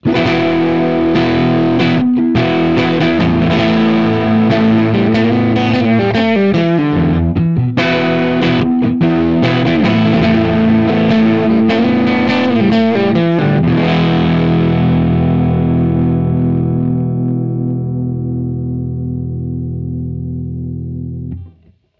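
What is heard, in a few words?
Squier Crunch Both Pickups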